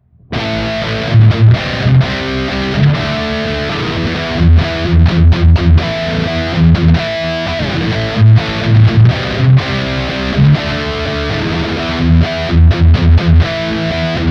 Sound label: Ibanez RG631ALF Both Pickups Highgain